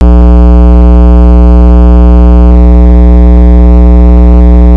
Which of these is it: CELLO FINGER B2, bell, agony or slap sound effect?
bell